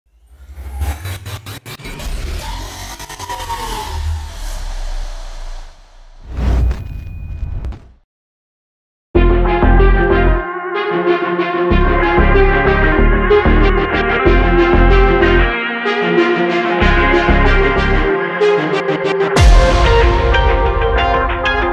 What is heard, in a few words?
hard motivational rap beat rock inspired type